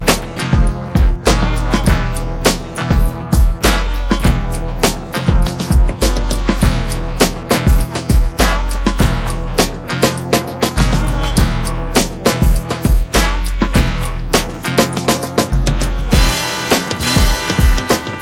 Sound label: instrumental